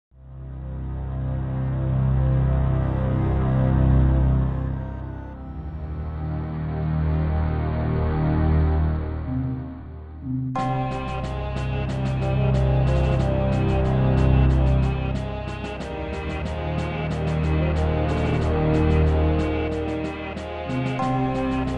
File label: orchestral; AVGN